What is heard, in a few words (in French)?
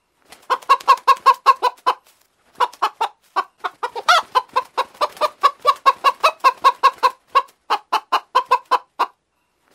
poule